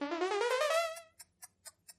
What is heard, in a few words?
mischief emote; animal crossing